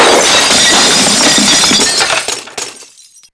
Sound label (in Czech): sklo; Mafie 1